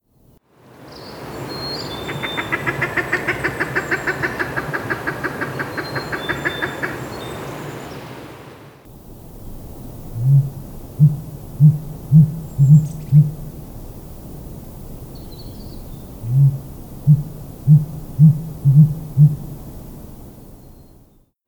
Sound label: Sooty Grouse